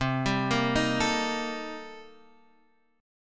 C-Minor 13th-C-down, guitar; arpeggiated; JGuitar

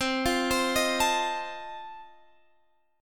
F 7th C

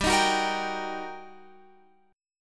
G Minor Major 7th Sharp 5th, down, Guitar, Open F; GmM7#5; strummed

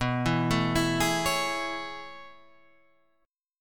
A Major 7th B; arpeggiated; JGuitar

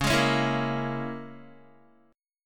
Db Minor 6th Db down Guitar; strummed; 4E,4A,5D,5G,5C,6F; Dbm6

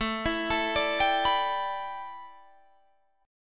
A 9th Suspended 4th, chord

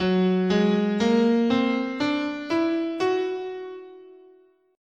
F sharp Whole Tone, scale

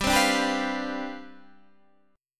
C Major 7th Suspended 2nd Suspended 4th, G, chord

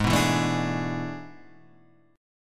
A Major 7th Suspended 2nd Suspended 4th Ab

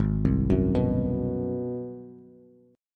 Ab 7th Sharp 9th Bass, chord; Ab7#9 arpeggiated; JGuitar

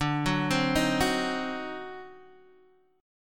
D 7th Flat 9th D, chord; D7b9 Chord {X 5 4 5 4 2} arpeggiated

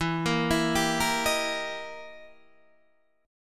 E Minor Major 7th Flat 5th E down Guitar Standard; arpeggiated; JGuitar

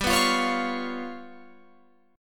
G 7th Flat 5th, Guitar, Open F; G7b5 strummed

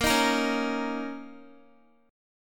B flat Minor Double Flat 5th Guitar, chord; Bbmbb5; strummed; x,13,13,15,13,x; JGuitar

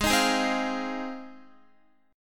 Ab 6th Ab, Guitar; Ab6 strummed

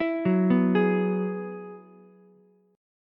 Gb 9th Suspended 4th, Ukulele C6; arpeggiated; 9,6,7,11; JGuitar

